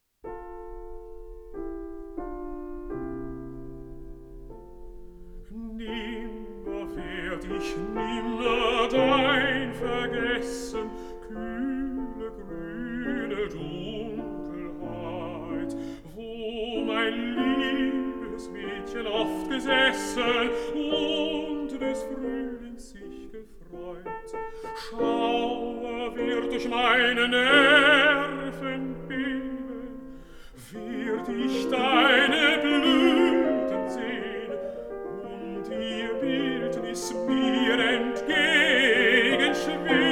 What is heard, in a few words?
song for voice & piano